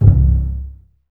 BSS C 1 Pizz, String Ensembles Basses; Miroslav Vitous

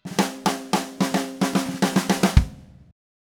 Red Maple Groove 110, DW Red Maple Kit

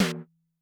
snare, Drum Machines, Clavia Nord-Drum; 300 Drum Machines

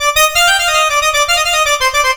SYNTH, ARABIC; USB Soundscan vol.43 - ArabianTraditions; AKAI

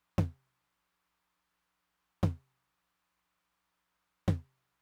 Mid Tom, Roland TR-909; Roland TR-909 Ableton Project

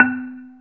BAMBOO, BAMBOO GAMLAN; Zero-G Ambient 1

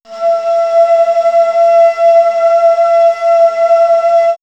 Bamboo Flute; X-Static Goldmine; backtothe70's

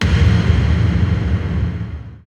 TOM TOM, Roland

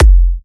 Kicks F; markus hakala

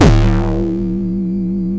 Electron Sidstation, Drum Machines

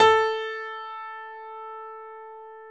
PIA HIGH G, Grand

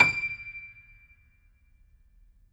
JAZZ D6, Keyboards Acoustic; AKAI; USB Soundscan vol.17

